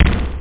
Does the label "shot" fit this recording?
Yes